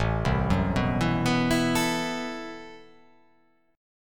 A Minor 6th Add 9th A, Chord; Am6add9 arpeggiated; JGuitar

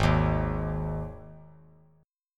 G sharp 5th G sharp; strummed; JGuitar

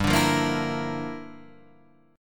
G Minor 13th G down, chord; Gm13 strummed; JGuitar

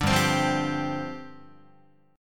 G 7th Suspended 4th G down Guitar

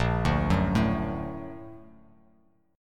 Bb Augmented Major 7th Bb, Bass Standard; Bb+M7 arpeggiated; JGuitar

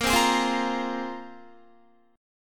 Bb 7th Suspended 2nd Bb down Guitar; strummed; 4E,4A,5D,5G,5C,6F; Bb7sus2

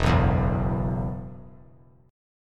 E Suspended 2nd, down; Esus2 strummed

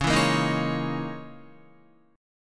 Db Minor 6th Add 9th Db down Guitar; Dbm6add9 strummed; JGuitar

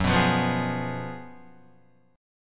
Gb Major 7th Suspended 2nd Suspended 4th, Guitar; GbM7sus2sus4 strummed; JGuitar

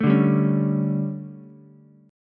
D Major 7th Suspended 4th, Ukulele; DM7sus4; strummed; JGuitar

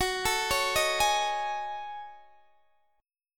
F sharp Minor 6th Add 9th, Guitar DADGAD; chordshape; down

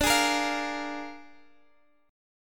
Bb 7th D, chord

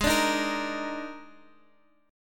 Eb Major 7th Suspended 4th Ab

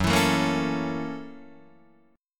F sharp 7th Sharp 9th, Guitar, Open D

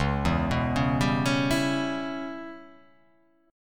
C sharp Minor 7th Flat 5th C sharp

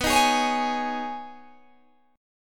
B 6th Add 9th B down Guitar Open G

